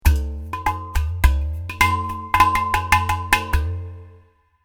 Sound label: son hang down